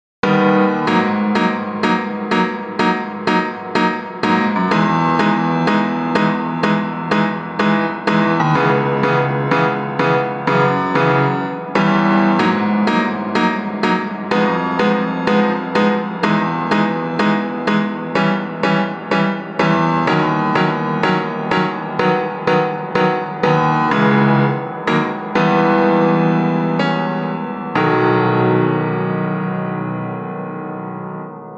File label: piano size big